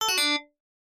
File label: Sys Set pop down